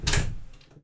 door open

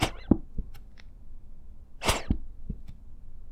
Plop - Wheeze, bottle plops

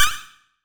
menu; Free SFX for anything

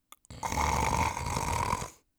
sleep, orc voice; Orc Voice Pack

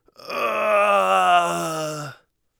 bleeding, Warrior Voice; Warrior Voice Pack

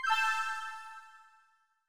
confirm, tones; inteface sfx pack 1

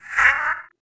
alien, creature

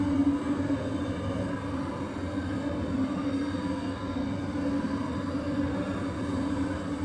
ambient; 30 cc0 sfx loops

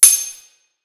hammer big; tools

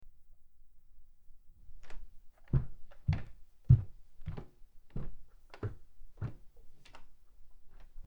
Wooden Foosteps; horror; horror sfx vol 2

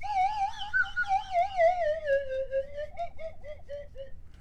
Drunk, Slide Whistle

